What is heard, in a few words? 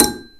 short bell